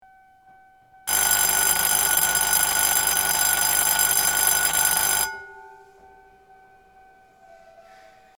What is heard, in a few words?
Yoshiwarahoncho Bell; 入線ベル